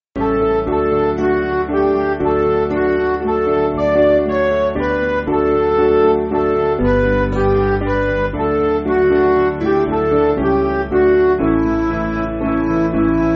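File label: Midi, Music Backing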